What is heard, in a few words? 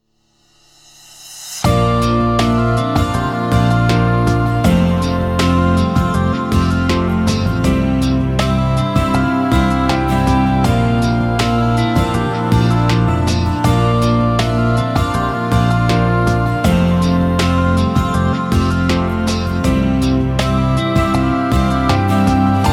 Music background pads